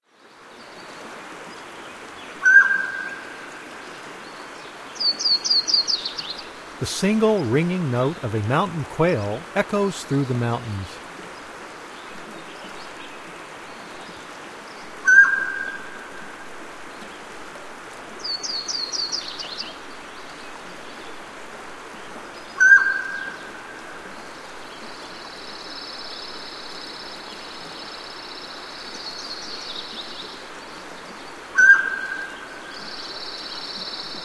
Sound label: Mountain Quail; North America; Common Western Species; Know Your Bird Sounds